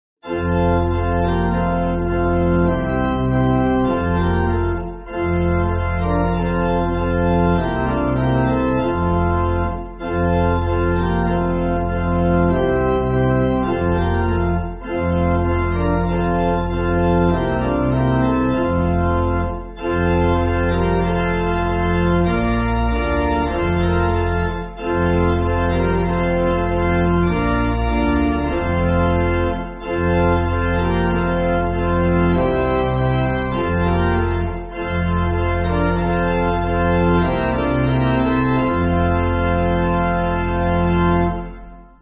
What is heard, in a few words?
Pipe Organ, Free Music Backing Files for Churches; Jesus Faithful To; Greenville; Rousseau